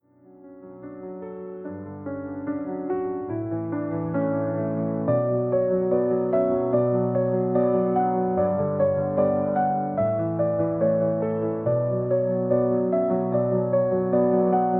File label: Piano